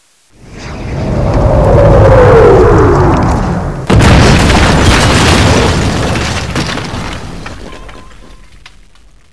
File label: arty hit; ramelle